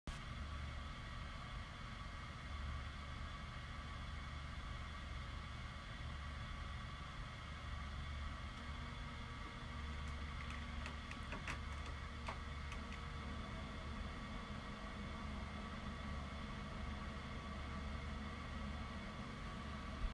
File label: air conditioner